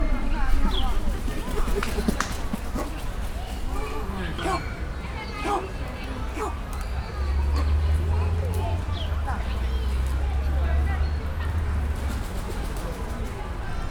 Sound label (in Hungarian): három halk hangocska, tüskésnyakú íbisz; debreceni zoo 2019